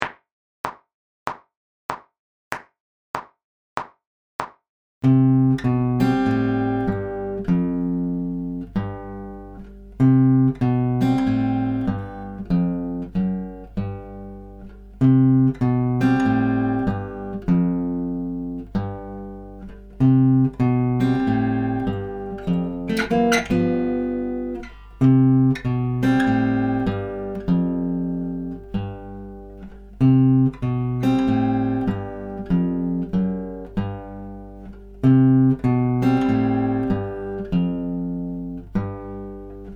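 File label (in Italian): Giro di basso indice e medio, chitarra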